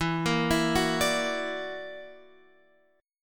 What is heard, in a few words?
F sharp Augmented 7th E, chord